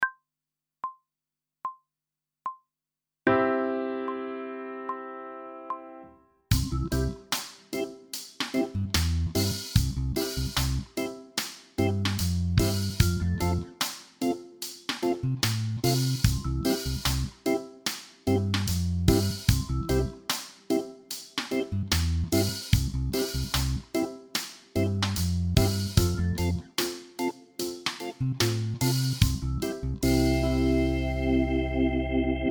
Technical Work Riffs C major backing track, pop vocals; Grade 3